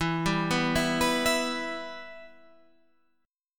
E-Minor

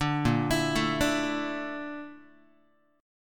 A sharp Major 7th Flat 5th A sharp Guitar; A#M7b5; arpeggiated; JGuitar

